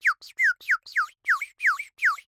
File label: Bird whistle, clay, painted, water, airy, wispy, rapid, active, 7 chirps; Eiravaein Works; Vaeyan IV